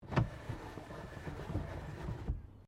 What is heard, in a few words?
CAR HOLDEN FE WINDOW MANUAL CLOSE, Classic Australian cars; Vehicles; SoundEffects-Foundation1